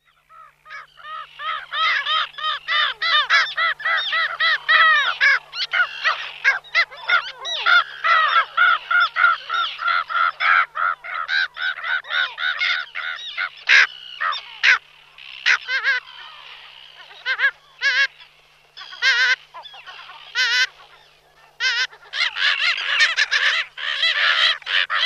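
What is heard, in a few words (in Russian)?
morskoy golubok golos, slender-billed gull voice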